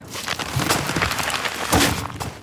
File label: sound push weird